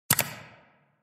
mouse click sound

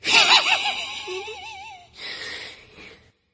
laugh

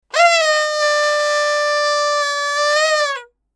funny birthday horn

